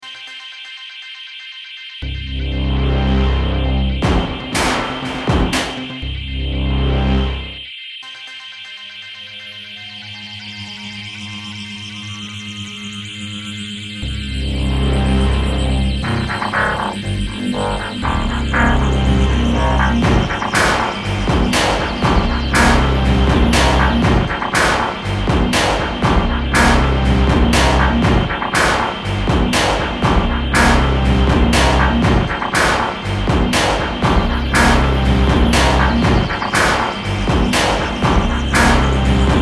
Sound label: Industrial